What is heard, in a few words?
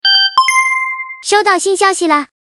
notification message